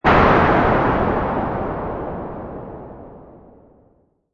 Space Explosion Shorter, Explosion in space, long decay, with reverberation